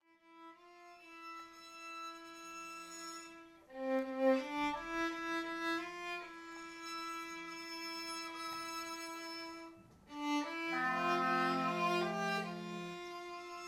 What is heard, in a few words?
birds